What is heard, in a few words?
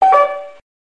computer error